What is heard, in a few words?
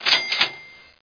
cash reg